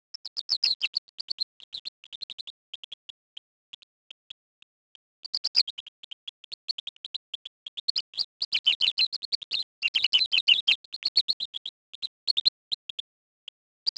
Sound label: Mouse song Pitch Shifted 16x longer segment